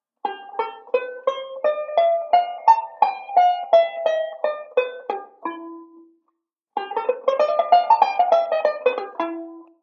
Modal Sequence, banjo

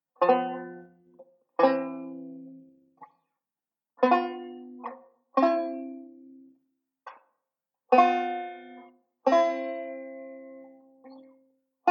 Tritone Resolution, chord melody; Beyond Chord Melody Volume II

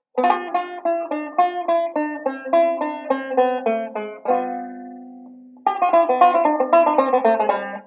ii V Pattern, banjo

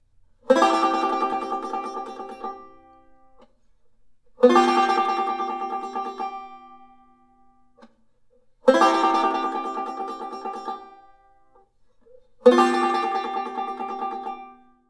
Tonic Dominant G; Beyond Chord Melody Volume II

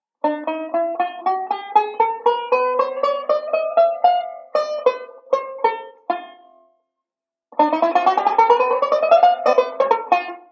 Chromatic Run, banjo; Beyond Chord Melody Volume II

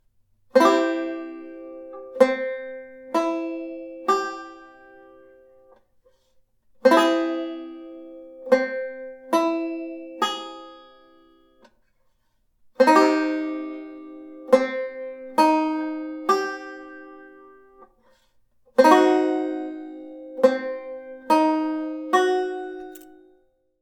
Root Triads; Beyond Chord Melody Volume II

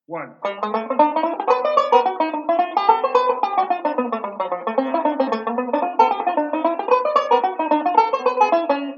I V I Etude jazzy, banjo; Beyond Chord Melody Volume II

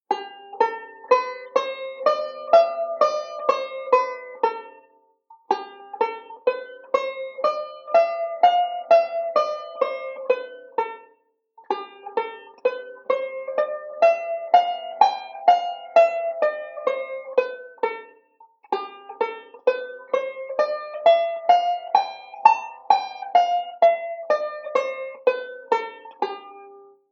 G Mixolydian, banjo; Beyond Chord Melody Volume II

